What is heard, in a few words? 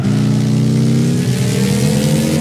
first, vehicles cars; morgan 3 wheeler